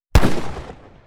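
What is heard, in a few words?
colt exterior shot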